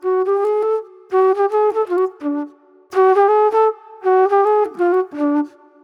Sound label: mellow soul flute; Sonic Foundry (Sony Creative Software); Manhattan Flutes; Soul 1